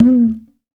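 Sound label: conga, African Perc; Spectrasonics; Supreme Beats; African Contemporary; SB Afro DRMs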